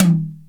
tom hi; roland2b

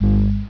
Pulse; Grid Warrior™ Demo

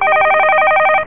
Dial

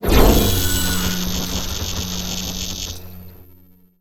mine dep

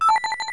Computer Sound Down; The Official Guide to Babylon 5

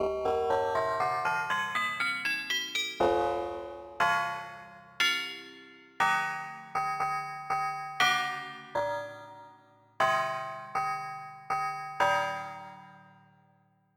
Glockenspiel

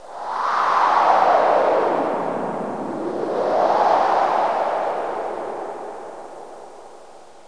Flag; CD Action

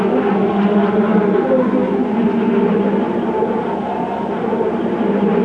ringed; The Rift

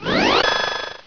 Multiplier appears; Bedlam 2 1.1.1; Bedlam Sounds

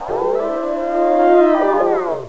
howl; duke nukem total meltdown

